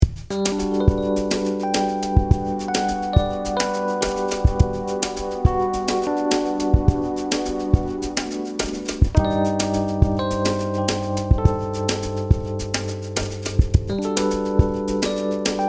Groovy lounge jazz; Stockholm

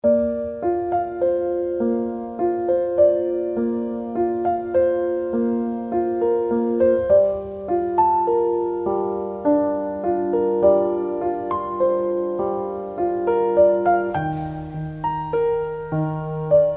Piano collection

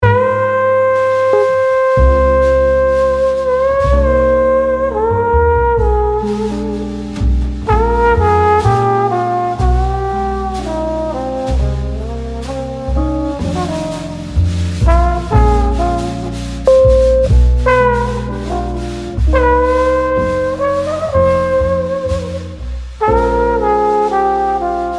Jazz

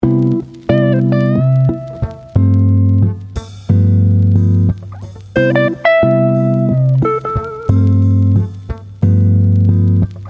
jazzy lounge

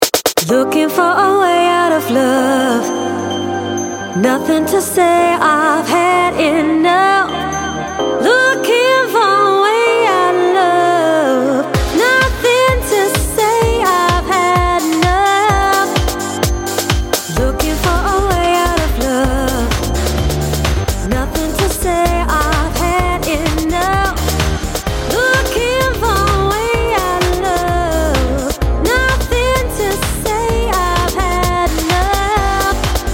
pop groove

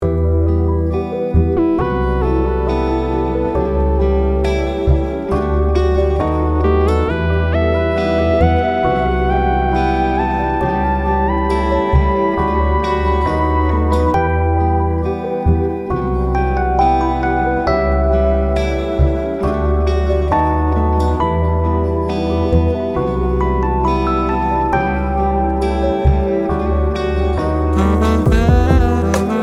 jazzy beats

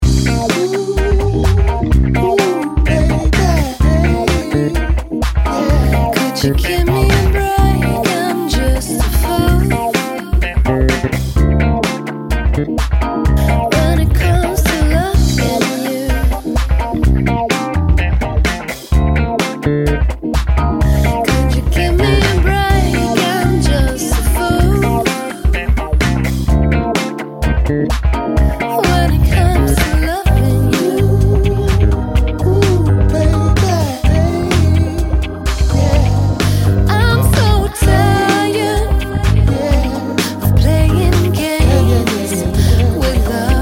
pop pulse

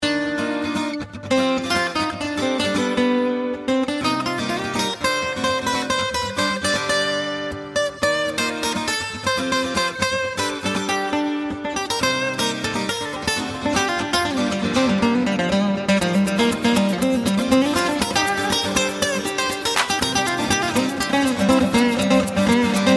Salsa latino radio

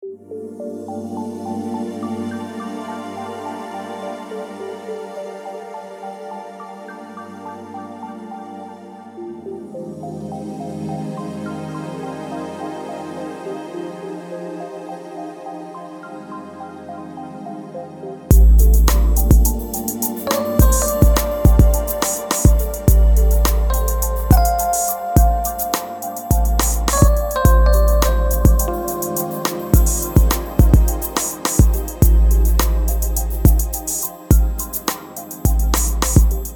Peaceful Way jazzy lounge; radio

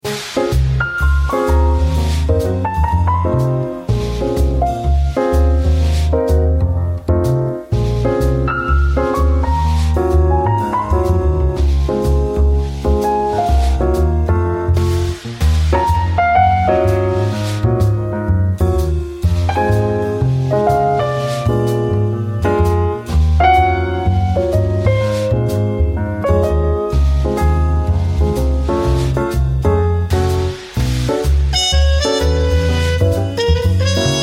cozy jazz

